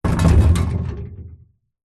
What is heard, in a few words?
industrial heavy object load into metal trailer